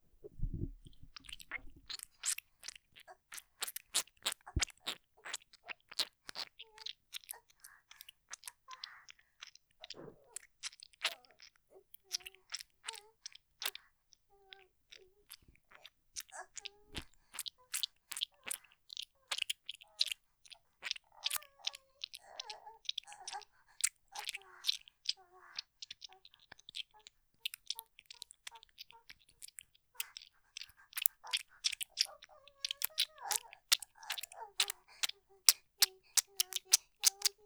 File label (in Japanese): 効果音 おまんこ ぐちゅぐちゅ; ループで楽しむ！シェフの気まぐれ抜き抜きコース